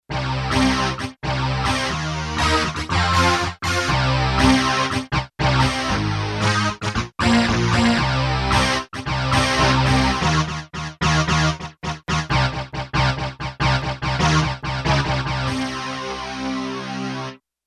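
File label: Distorted Guitar Muted; Digital Synthesizer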